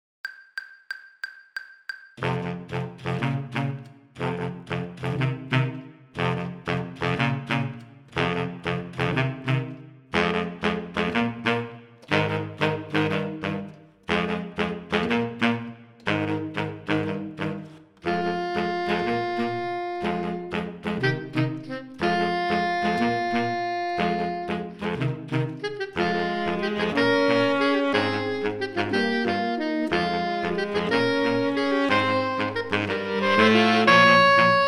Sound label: playalong alto; battlefield 1942